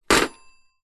household telephone vintage rotary style hang up hard; zapsplat